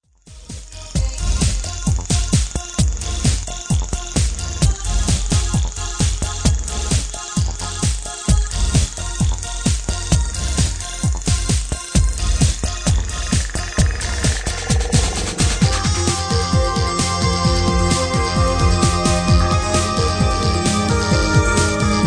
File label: Instrumental Version